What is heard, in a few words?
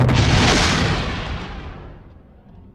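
launch of a combat rocket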